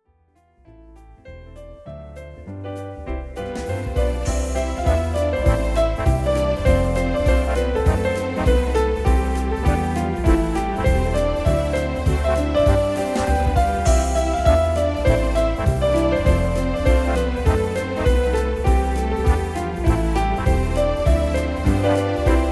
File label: QUICKSTEP